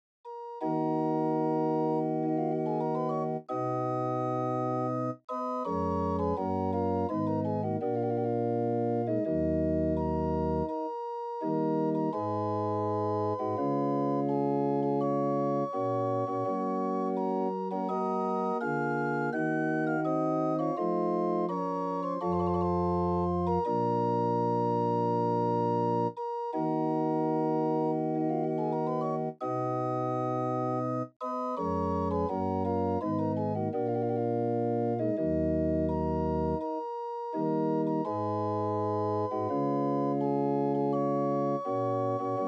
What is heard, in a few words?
Organ Pipe Gedackt 8 4; Locke Air